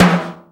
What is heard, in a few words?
MID TIMBALE; Greytsounds VOL-1; SDX PERC